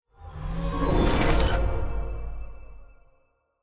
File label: map panel light